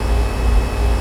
Machine Generator Loop; SFX Kit; Machinery - Generators

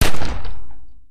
colt fire; firefight

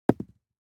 DICE on Felt, Throw and Roll, Standard, 1 One Die; Samples; ArticulatedSounds; DICE Sfx Party (Extended)

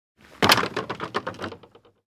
wood gate; Inquisitor 2

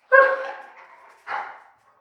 dog pout; Apotheorasis

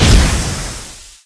Gas Bomb Discharge; Destruction

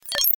utility installed; Code7

